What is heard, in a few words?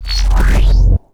Settings Sub Menu Out, Transition Audio; XDK4627 BIOS and XDK Launcher and Dashboard(for Retail); ogxbox